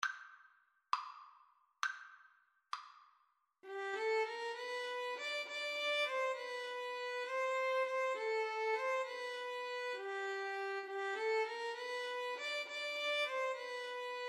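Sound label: flute violin karaoke; Violin-Flute Duet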